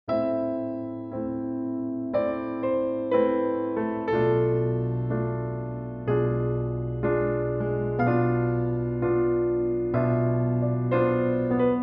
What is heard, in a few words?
Adagio Piano